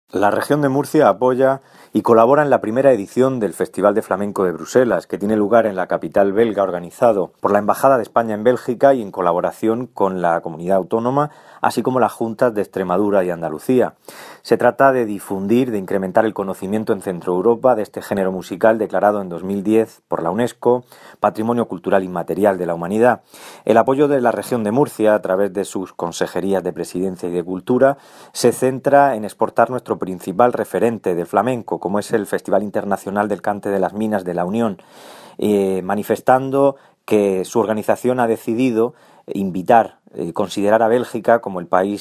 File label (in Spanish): Flamenco; Bruselas